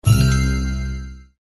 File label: win Last; 1casino-supreme-hot-anim